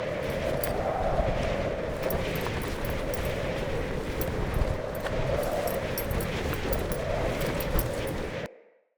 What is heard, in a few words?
Player Falling Wind Loop